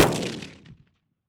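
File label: shotgun exterior shot; saiga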